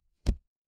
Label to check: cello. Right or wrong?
Wrong